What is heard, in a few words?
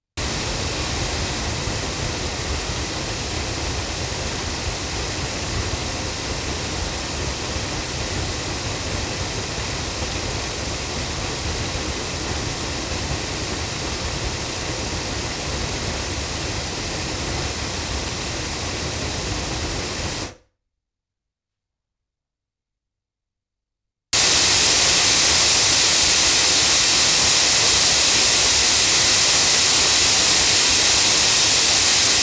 ADK A51TL loudspeakers, microphones recordings